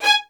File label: LDVLN1 ATT G, LOUD VIOLINS